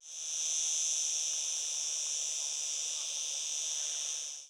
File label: cicada barbara